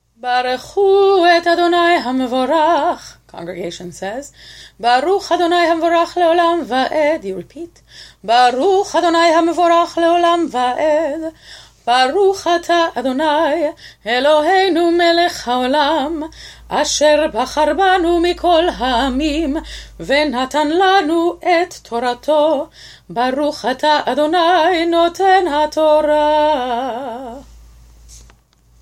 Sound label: Blessing Before Torah